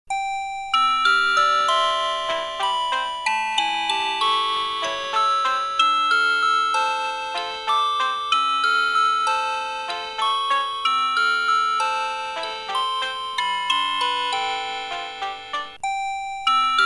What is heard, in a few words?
alarm ringtone; samsung